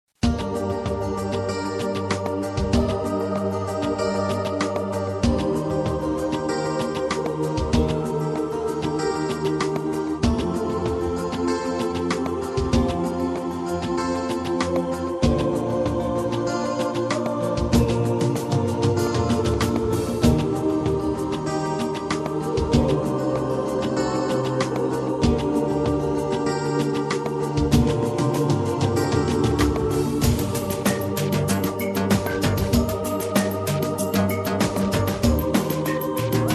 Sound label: ambient with nature